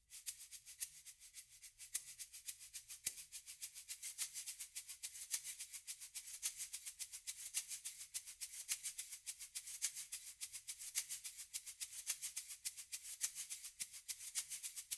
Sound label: Shaker